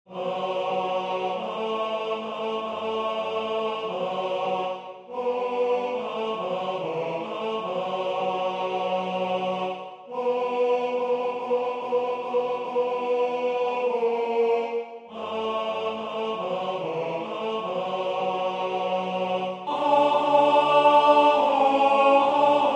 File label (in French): tenor 2; O nuit brillante